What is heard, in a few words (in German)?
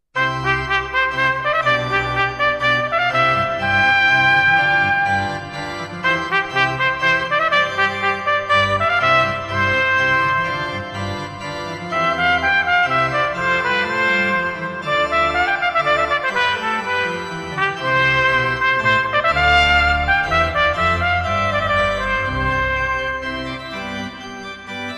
Digitalorgeln; Truhen Positive; Tromp